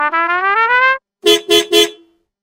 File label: tour end